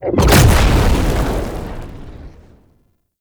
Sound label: Summon Cast Impact; Roathe; Warframe; Tau; Abilities